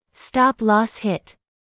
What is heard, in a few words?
stoploss hit; QuantumTerminal